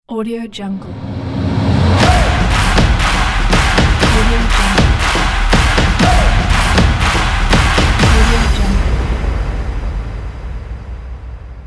stadium football stomp logo

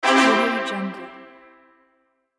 tada fanfare

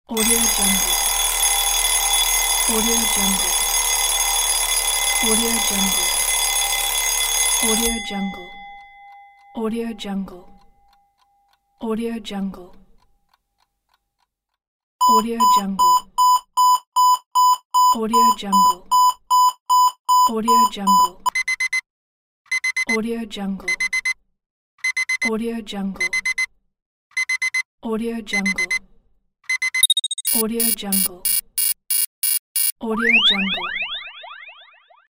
alarm collection

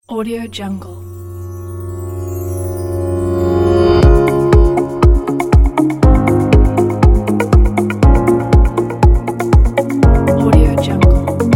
corporate

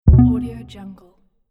warning

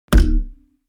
impact metal heavy saturated damped

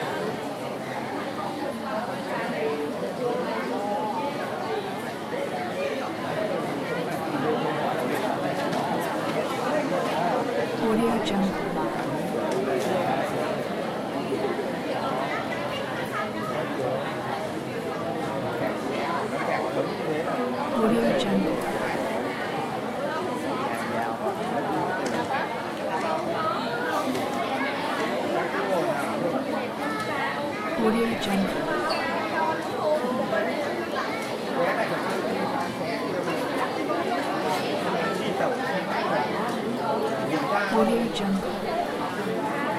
crowds walla train station interior vietnam loop

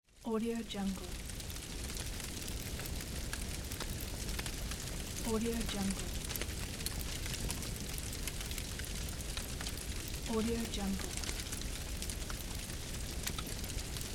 fire

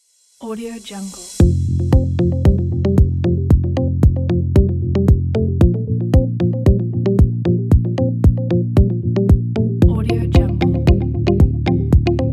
short corporate

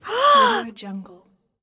girl gasp

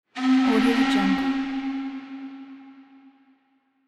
steamboat whistle

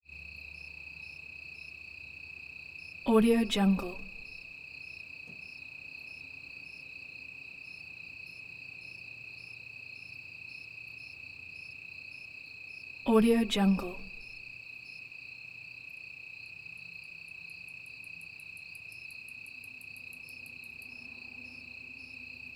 night ambience templar hwy canyon dusk; prosoundfx

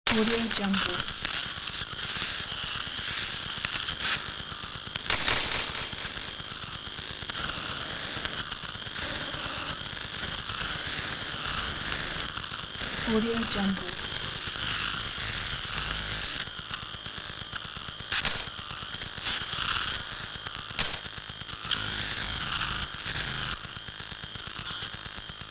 overcurrent fx

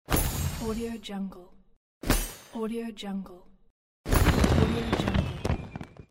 fireworks

